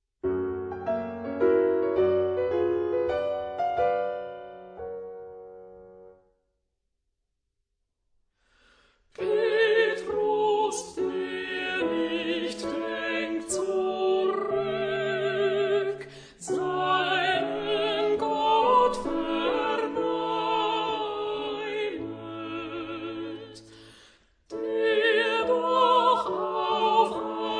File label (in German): Petrus der nicht denkt zuruck zang alt